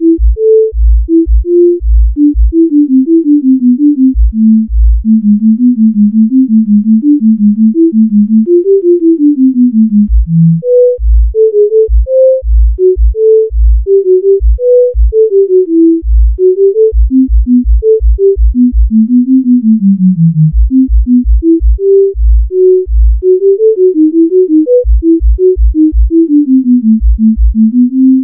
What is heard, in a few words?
sine; BWV 1041